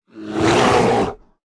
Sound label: furbolg attack; mfurbolg